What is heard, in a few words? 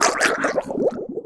drowning, combat; blobAndConquer